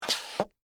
High Heels Thick, Footsteps, High, Heels, Thick, Heel, Scrape, Wood, Surface; Footstep Sound Effects